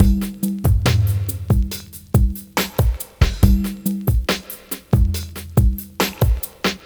Slomo Beat 140 BPM; Vengeance Packs; vengeance essential club sounds-3; VEC3 Loops 140 BPM; VEC3 Slow Motion Beats 140 BPM

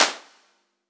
Claps & Snares

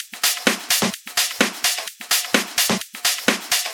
Minimal Loops

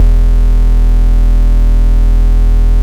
Root E, Bass

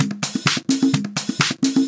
Percussive Loops 128BPM; Vengeance Samples

